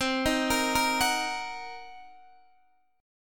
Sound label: C Minor 7th Flat 5th C down Guitar DADGAD